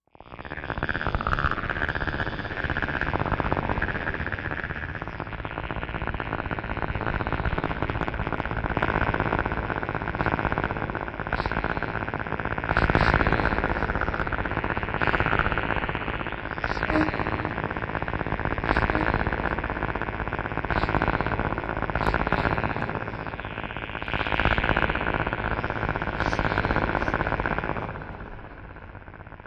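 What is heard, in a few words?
Transmitter Machine, radio; Apathy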